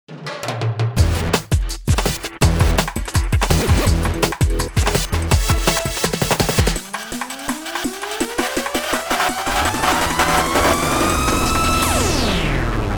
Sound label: Frat Party Rave